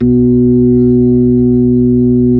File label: B-3 Club Xfade, Keyboards of The 60's and 70's